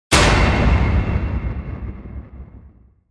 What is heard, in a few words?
missile hit big